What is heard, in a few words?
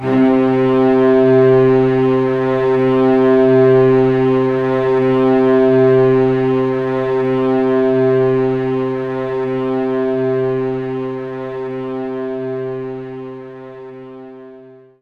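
String Loop C2; knights try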